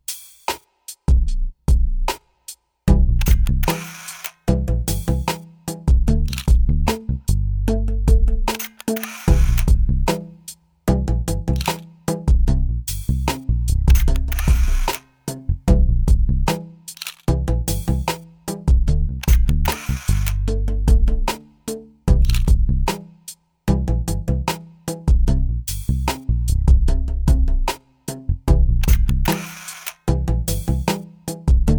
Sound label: Boomwhacker; Special Needs